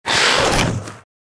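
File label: lizard attack Mid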